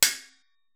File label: ore punch; PixelDefense